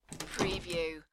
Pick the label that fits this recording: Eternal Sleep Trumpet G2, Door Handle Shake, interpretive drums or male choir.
Door Handle Shake